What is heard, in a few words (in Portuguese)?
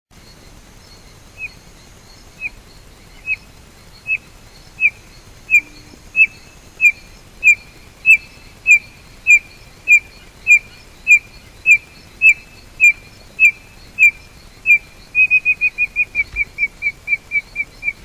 codorna amarela